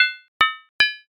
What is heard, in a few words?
armor